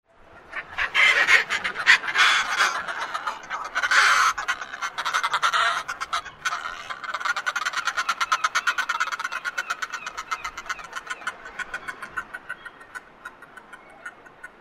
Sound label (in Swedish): Stormfagel